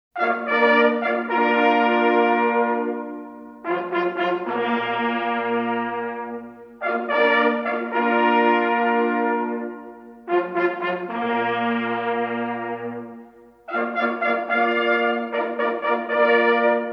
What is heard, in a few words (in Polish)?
muflon na rozkladzie, sygnaly